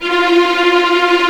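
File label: F4 tremolo, strings; East West; The Ultimate String Collection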